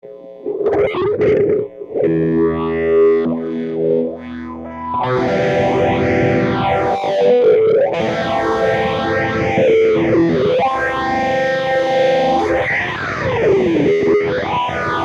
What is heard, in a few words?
electric